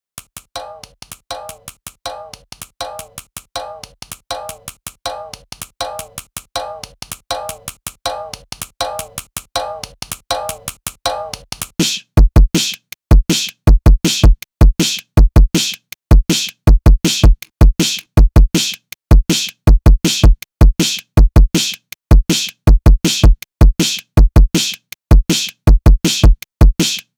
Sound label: drums